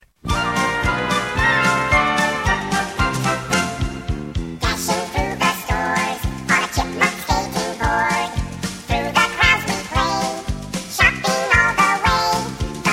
jingle bell chipmunk; ringtones

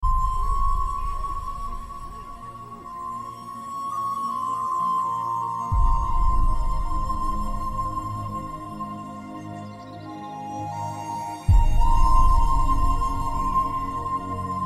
Love BGM

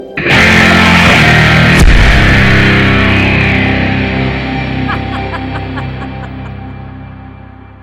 hard Rock Guitar

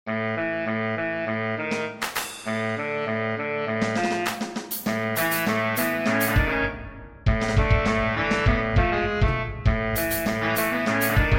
midi; Portal2

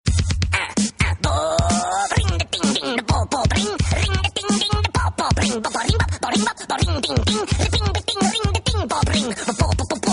frog hiphop

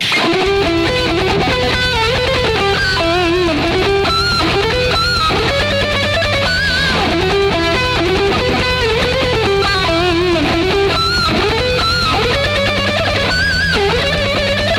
metal; angry birds

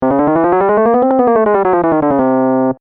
Chromatic Piano

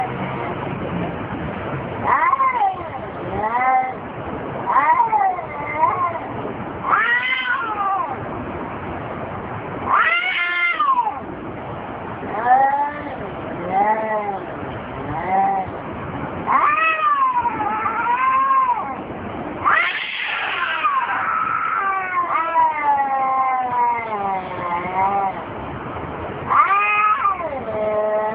cat fight

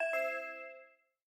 update end fail

